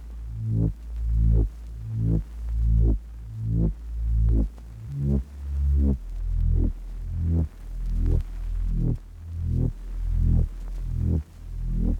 80 BPM C# Min Bass; Vortex

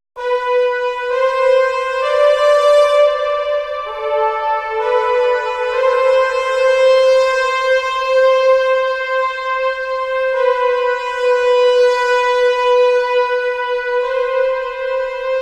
Solo Brass Am 65Bpm; Construction Kits; Ghosthack; SkyRealm; Solace in Falkreath

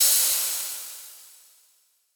Crash; Hats and Cymbals

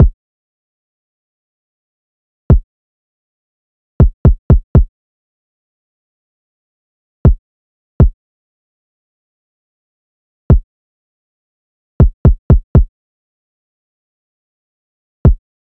Drum Loop 120 BPM Kick; Cymatics; Vibes II Drum Loop Collection; Bright Drum Loop

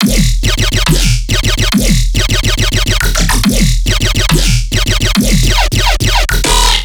Riddim Bass Loop 140 E Jagged; Dubstep; Riddim Basslines; Ghosthack; Advent Calendar 2019 Day 17; Riddim Bass Loops

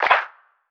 Lofi Clap; Cymatics; Lofi Starter Pack

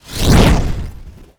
wooshes transform sweetener; Whooshes

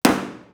fx pop; Cartoon - film effects

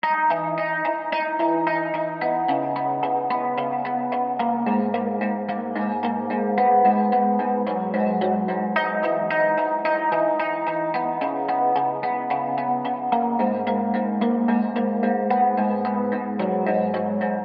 110 BPM G# Min Guitar; Stems; Cymatics; Cymatics - CHAOS Beta Pack (MIDI + Stems); Dead Inside

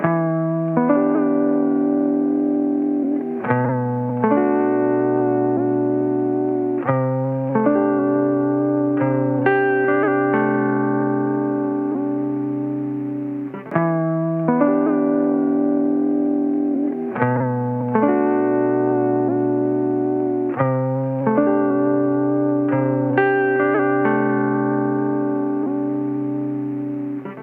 Electric Guitar - 70 BPM E Min, dry; Melodics; Cymatics; Eternity Sample Pack; Guitars - Electric

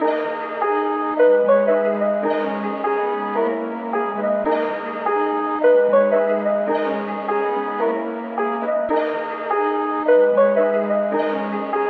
108 BPM G# Min, Melodies; Narcotics; Cymatics; Immortal Production Suite Beta

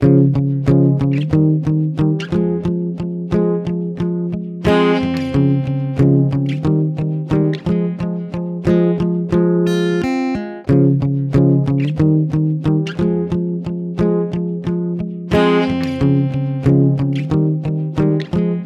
Guitar 90 BPM (C#maj); Guitar Loops; Caveman Fields; Ghosthack; Guitar Loops & Shots

